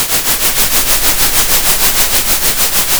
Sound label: center, Sound of a train